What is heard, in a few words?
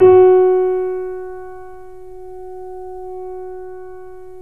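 F#1, Bösendorfer, 3D Pianos; E-MU Producer Series Vol. 5 – 3-D Audio Collection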